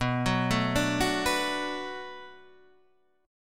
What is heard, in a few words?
D 6th B, chord